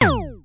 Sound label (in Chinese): 激光; 武器音效